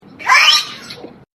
cat throwing up

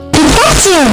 danger load sound; famous pkm

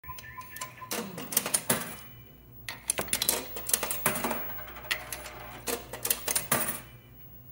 coin insert

